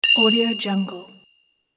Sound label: crystal ding